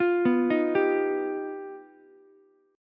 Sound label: G Augmented 7th, Ukulele; G+7 arpeggiated; JGuitar